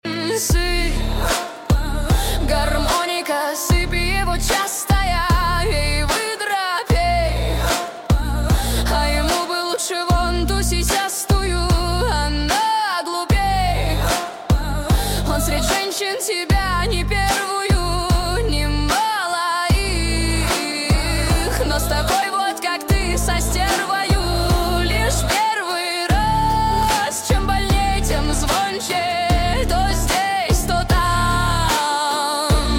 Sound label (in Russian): garmonika jenskaya versiya